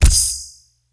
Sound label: elec mag combine